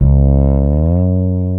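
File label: SLIDE C#-F#, FRETLESS BASS; Northstar - New Gold VOL-2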